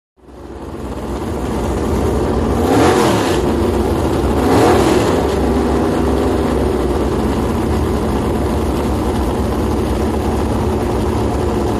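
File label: Inboard Outboard Boat Idle, motorboats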